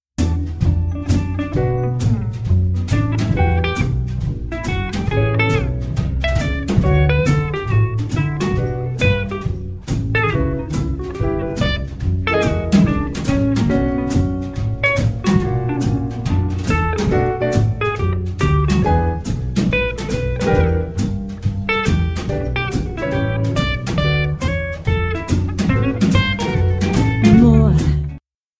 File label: solo